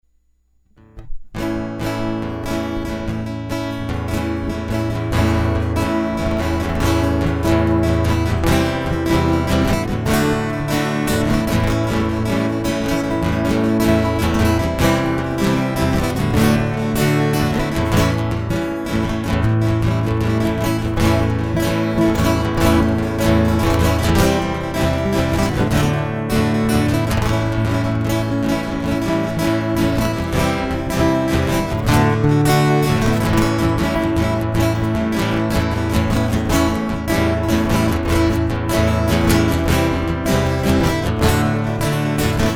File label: Electric Guitar Interlude